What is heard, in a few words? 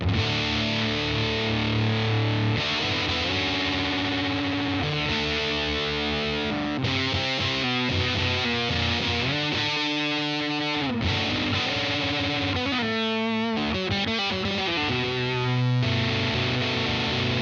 Fuzz; La Feline